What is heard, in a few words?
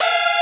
synth